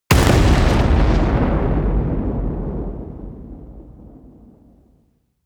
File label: missile large explode close